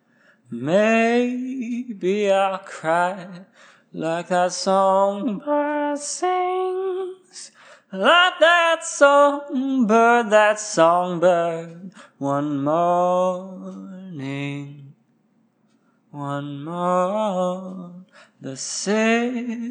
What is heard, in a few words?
Acapella, 90BPM; Pony Fan Music Remix Stems